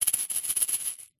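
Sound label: maracas energetic shaking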